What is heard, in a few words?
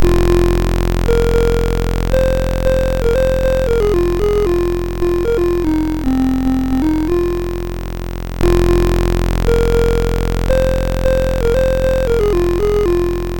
Laser Storm